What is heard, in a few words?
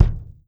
Kick